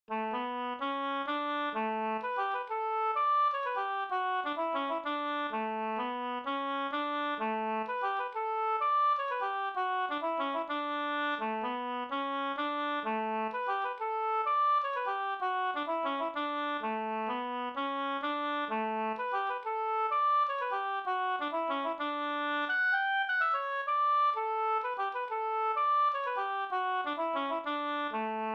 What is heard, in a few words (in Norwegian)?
pols Rorospols; e Paula